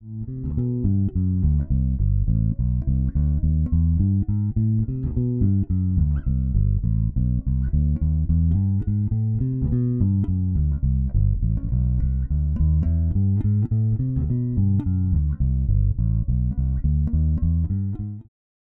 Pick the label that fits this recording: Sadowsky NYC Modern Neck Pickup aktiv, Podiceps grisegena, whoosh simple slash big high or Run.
Sadowsky NYC Modern Neck Pickup aktiv